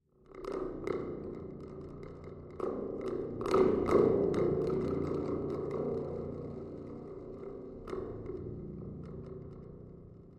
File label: Spring Drum Sml